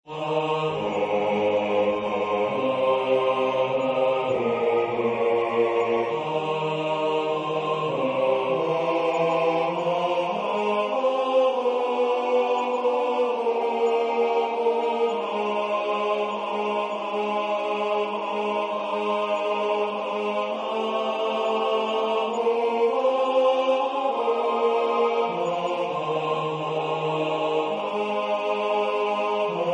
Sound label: Hymn bas